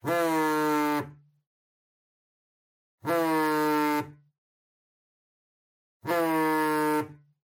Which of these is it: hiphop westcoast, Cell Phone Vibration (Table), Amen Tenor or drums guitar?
Cell Phone Vibration (Table)